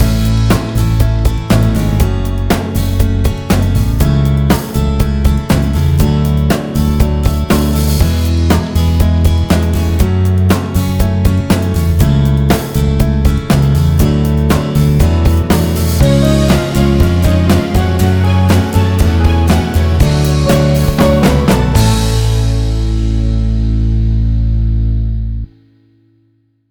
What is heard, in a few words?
Chorus and Outro